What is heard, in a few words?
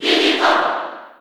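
Cheer Japanese; Diddy Kong; SSB4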